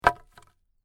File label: Wood Impact Drop Wooden Plank, Wood drop with slight rattle; Wood drop with slight rattle and settle.